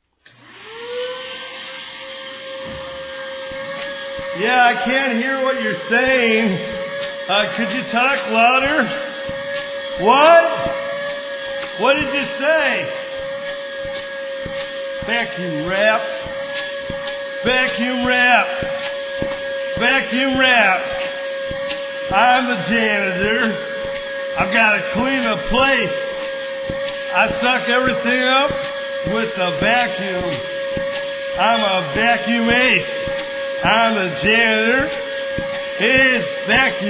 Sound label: Vacuum Rap